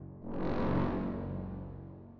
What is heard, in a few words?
Dramatic Brass